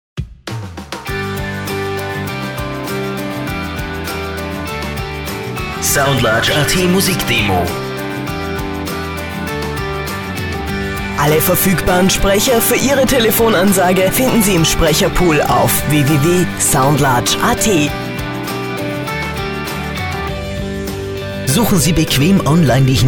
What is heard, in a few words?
Popular Rock